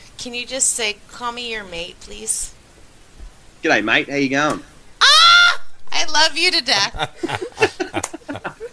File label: Mating Call